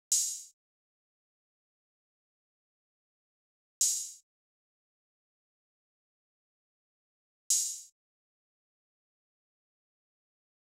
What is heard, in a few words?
Drum Loop 130 BPM Open Hat, Trap; Cymatics; ANALOG EVOLUTION Beta